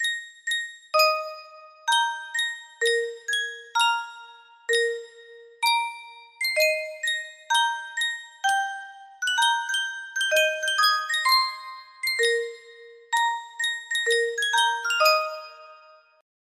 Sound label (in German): Spieluhr, music box melody